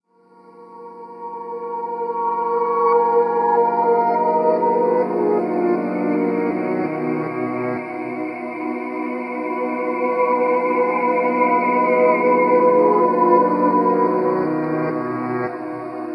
atmosphere; otome the exorcist

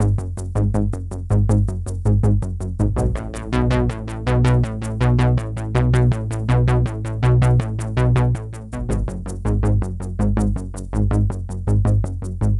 sounds music; Halo Combat Evolved; Xbox

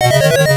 Death; Championship Lode Runner; SG-1000

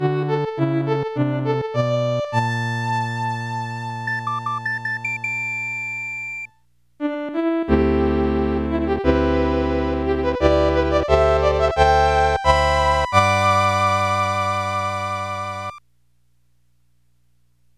BGM Ending; Super Mario Maker

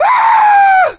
Scream Female

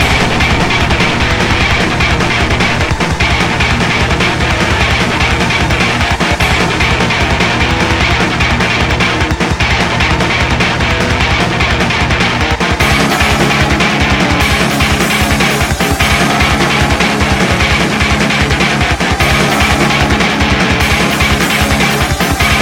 Boss Spider Loop; Blazing Chrome

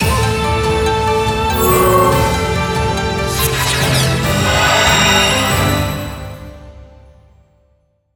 jingle equipment enhancement; sengoku taiga android ios mobile gamerip 2018

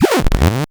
retro dj vinyl scratch

